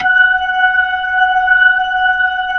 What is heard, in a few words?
B-3, Jazz